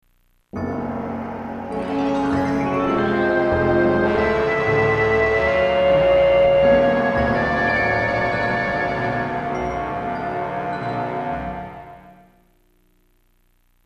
eerie; Indiana Jones and the Infernal Machine

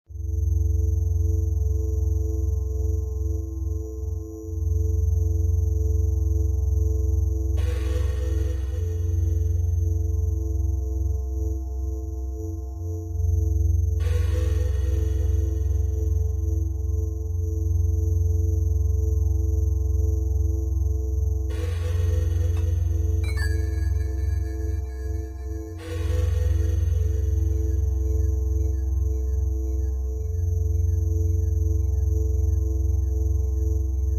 Ambience; Friday Night Funkin' Spooky's Saturday Scare soundtrack Windows gamerip 2023

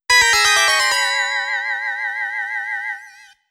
STAGE CLEAR; スプラッターハウス PART2

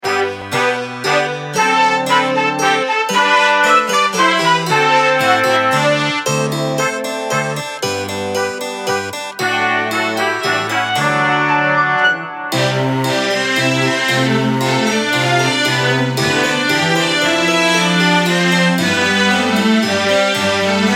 Bgm Fantasy; rpg maker mv

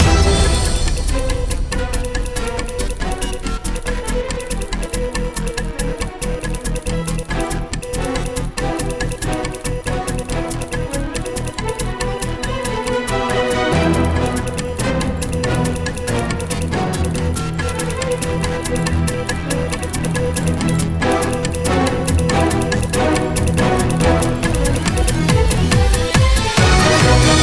Title Music loop

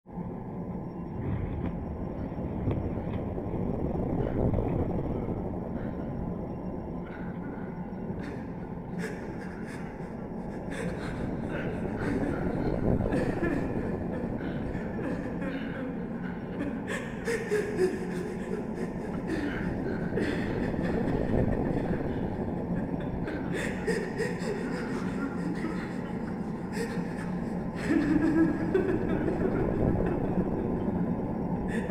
Spectral Ambience; Legacy of Kain Defiance

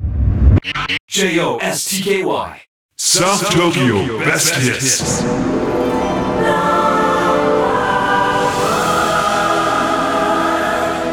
Radio Jingle; let it die

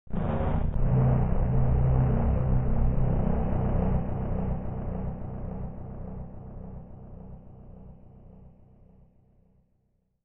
Danger Tonal; Insanely Twisted Shadow Planet

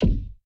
Moving Obstacle Stop Impact; boomerang fu

